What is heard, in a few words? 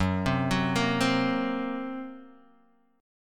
F sharp Major Flat 5th F sharp, Guitar Open D; chordshape; down